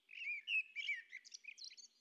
blackbird; MidDay; FontainebleauDemo

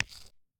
Attack Landing Rock

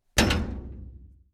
Dumpster Door Hit; BoatAttack

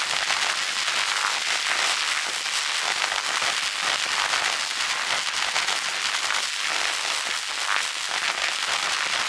Black Hole Generator Sparks

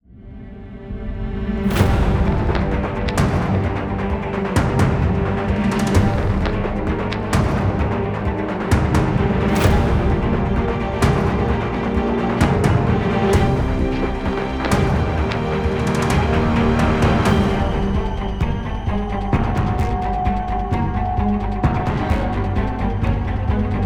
Space Combat, Music; SpaceshipDemo

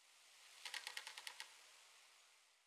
wood strain, Night; FontainebleauDemo

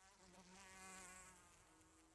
insect, MidDay; FontainebleauDemo

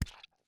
Attack Landing Plant

